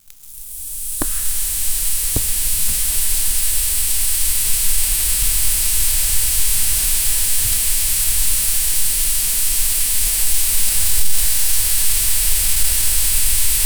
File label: strong flame sound; fire